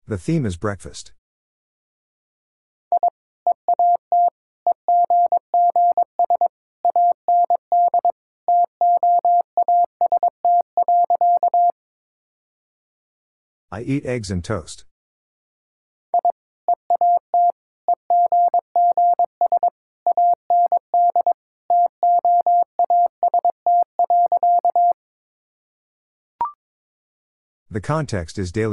Easy Sentences with Hints - 22wpm, morse code practice